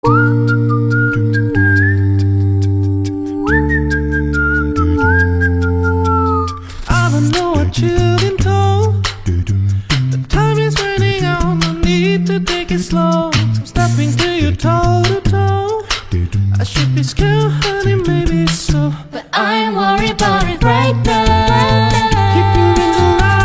Acapella; Top Gun Maverick

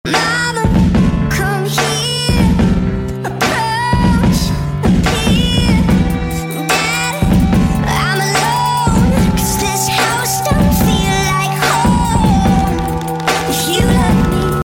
Mix Unsteady

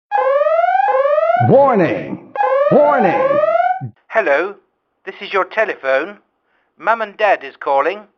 warning from telephone

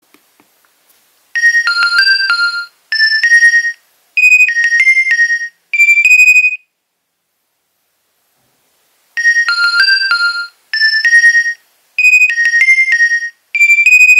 Nokia 2300 Ringtones

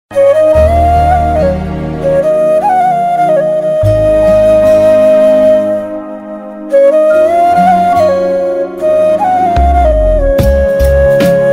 flute

